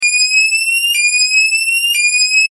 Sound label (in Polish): ton